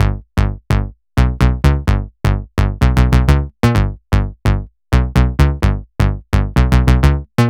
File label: Melody 128 BPM Root G#; Vengeance Samples; Melody Kits